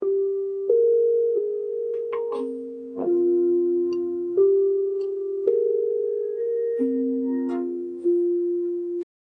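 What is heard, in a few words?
soothing lullaby for sleep, baby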